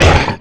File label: monster grunt